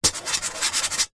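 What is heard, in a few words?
office pen scribble signature on metal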